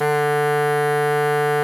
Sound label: kortholt D#2; SoundBytes - Early Patches